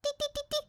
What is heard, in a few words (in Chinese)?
QQ提示, 手机提示音; Hanser手机提示音包